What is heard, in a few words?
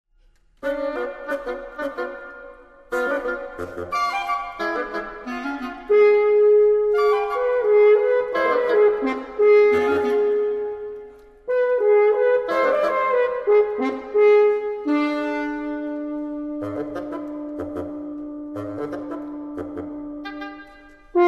wind quintet andante; paterson